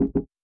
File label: wrong card; Casualino; VideoPoker